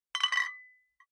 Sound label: flip-top bottles clink multiple; gastronomie; berufe